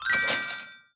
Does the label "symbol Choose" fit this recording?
Yes